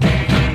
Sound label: ugh